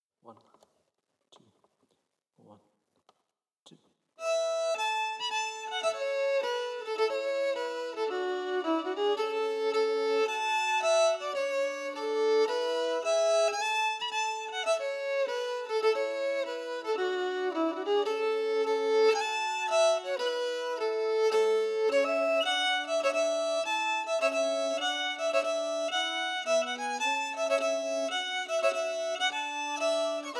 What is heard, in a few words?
Sidlaw Hills melody emphasized